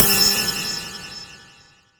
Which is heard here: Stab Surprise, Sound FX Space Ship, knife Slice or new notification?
Stab Surprise